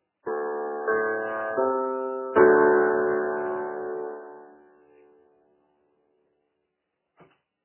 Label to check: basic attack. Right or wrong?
Wrong